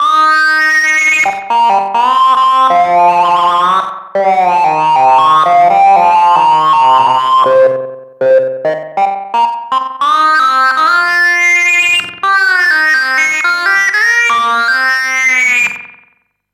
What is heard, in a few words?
Sync Lead, Marshall Echohead EH1